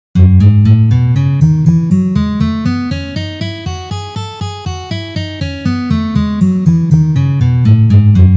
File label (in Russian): Gamma Mi mazhor 1 pozitsiya